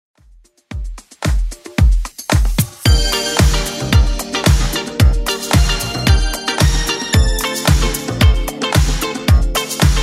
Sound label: 80s Club 112 bpm